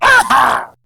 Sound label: Pain, Battle Barks; Warframe; Grineer Ghoul Sawman